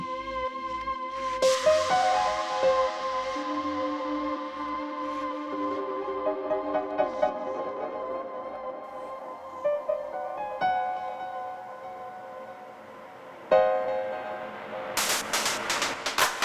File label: Organ Remix